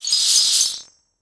Gravel

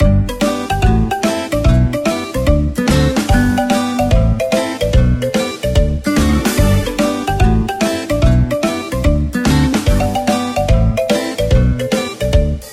bgm item spin

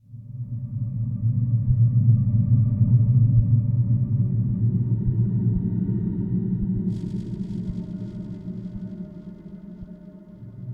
Ambient Suspension; Shadowgrounds; windows gamerip 2006

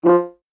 Rhythm Whoops; super crazy rhythm castle

